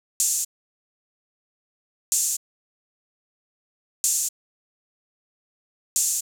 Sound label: Pepper Drum Loop - 125 BPM Open Hat; Cymatics - ANALOG EVOLUTION Beta